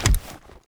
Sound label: ursus flip, knife; arccw go